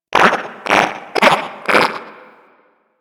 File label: Garden Animal; Warframe; Clan Dojo; Dojo Garden Animals